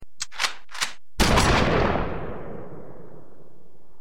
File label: Shotgun